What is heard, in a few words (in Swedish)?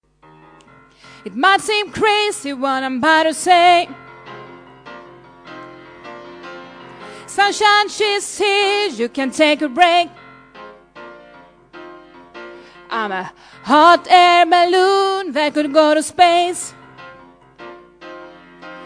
bas